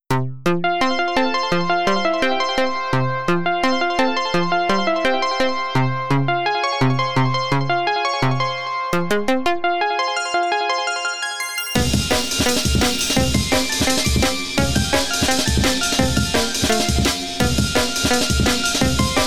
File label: maple rag